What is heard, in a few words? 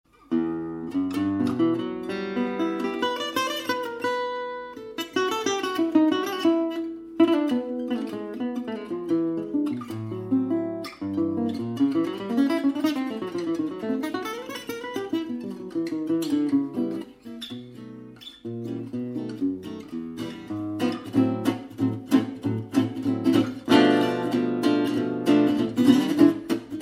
Selmer Hybrid Soundbox